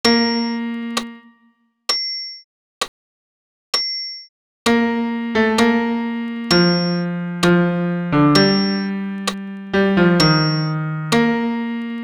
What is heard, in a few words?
bas pian seul; Gloria